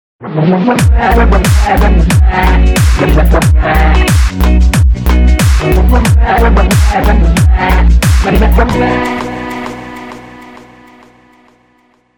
beatbox dog remix

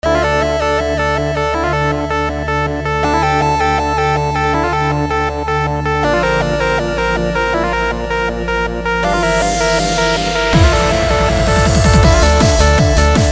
techno

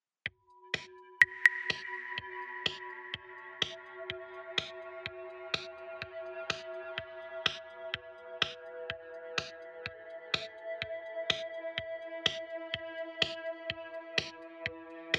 smooth eighties sound

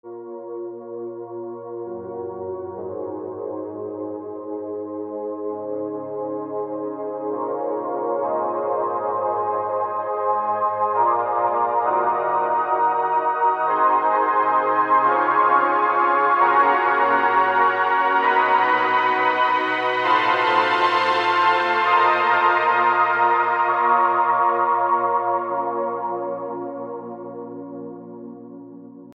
pad

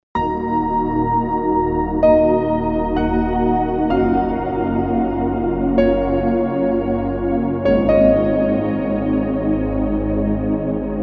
with reverb

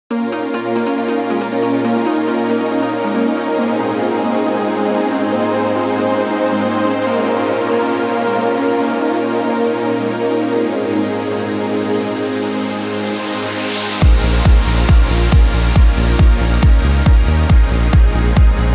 Trance Uplifting

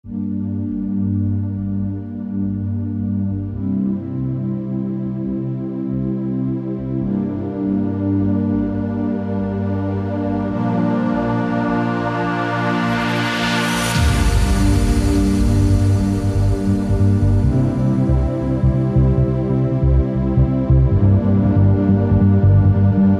uplifting baseline test

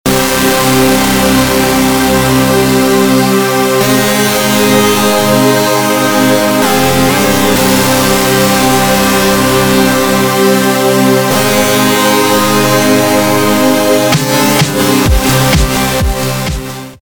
massive distortion

